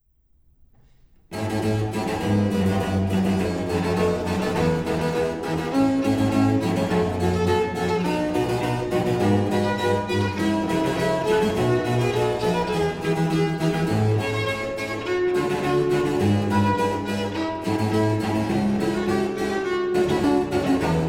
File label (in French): Prelude et Recitatif; Forges des Lemnos